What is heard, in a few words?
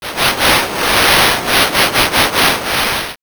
river